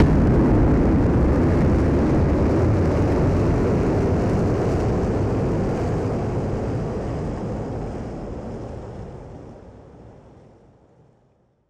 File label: Noise Bomb; musicradar; bomb factory